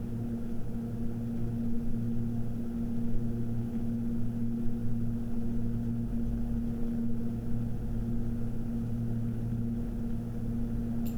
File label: 1000 RPM